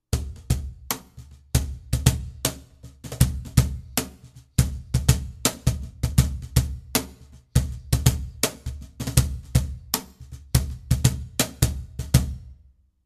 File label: Cajon Hip-Hop; Jose Cortijo